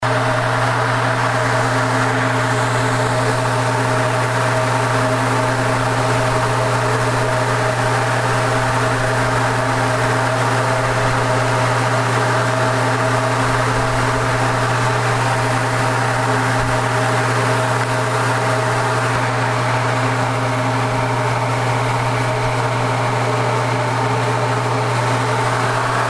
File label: grain dryer 95 105dB